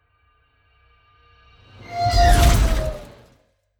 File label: whoosh metal bow howl